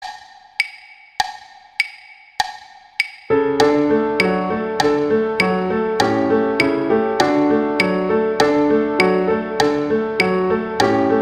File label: O Come Little Children; Christmas